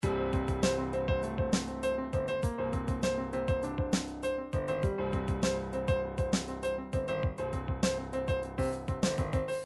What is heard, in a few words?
triphop alert, midi; Newtronic Triphop Construction Kit